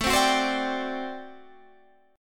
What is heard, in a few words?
G Major 7th Suspended 2nd Suspended 4th A